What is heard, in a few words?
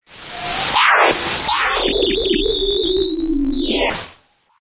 synth space weird synthy sci fi wind power up